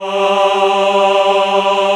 cho G2, Choir Male AH, AKAI S-1000; Retro Sampler

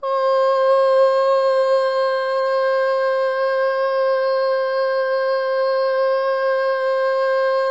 SOLO BOY, OH; Spectrasonics; Symphony of Voices

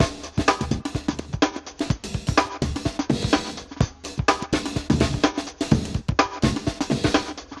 HIPPOP B R; Spectrasonics; Metamorphosis

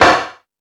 Lazer Drums, drum machine

